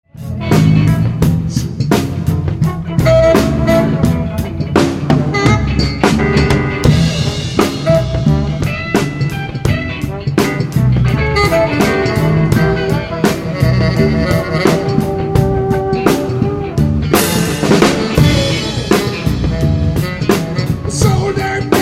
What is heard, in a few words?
Soul D Aix Funk